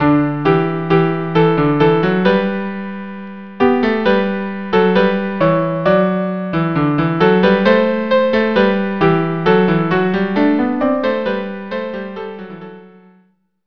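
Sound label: violin, cello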